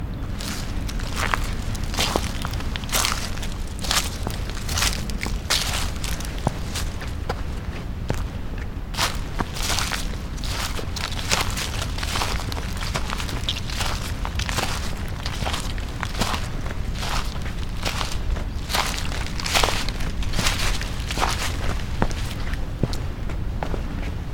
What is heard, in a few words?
ginko cryptomeria leaves